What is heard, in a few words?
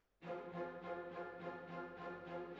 Hall